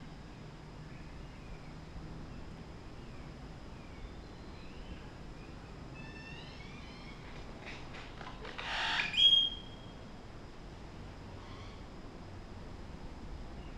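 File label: Garden Tone, a more private and quiet soundscape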